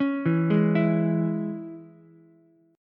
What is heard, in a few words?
Db Minor Db Ukulele; Dbm arpeggiated; 6,4,4,7; JGuitar